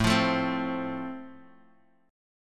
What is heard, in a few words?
A Minor Sharp 5th A, Guitar, Open F; x,4,5,4,3,x; strummed; JGuitar